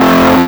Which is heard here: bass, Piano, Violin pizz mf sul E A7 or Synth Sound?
Synth Sound